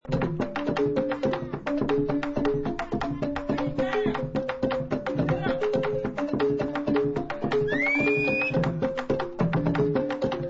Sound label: Balafon; telemark